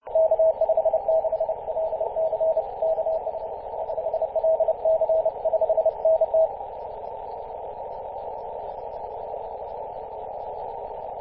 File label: 21.026MHz CW